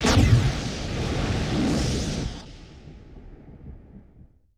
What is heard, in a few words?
WWII Explosion With Flare; Period Combat